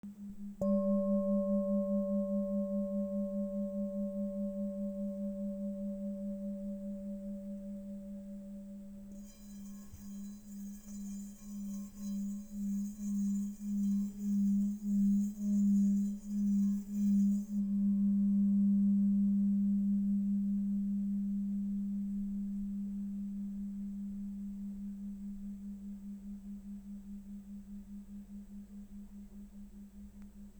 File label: G 199.2hz